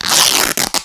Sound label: tearing fabric; theodyssey